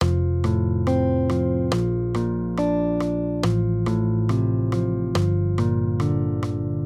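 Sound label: Guitar, Accompaniment, Major Triads, F-Bb, 140 BPM; maj5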